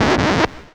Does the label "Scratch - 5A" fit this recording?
Yes